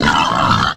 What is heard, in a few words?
boar Enter Play